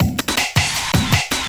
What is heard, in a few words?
Drum & Bass, 160 BPM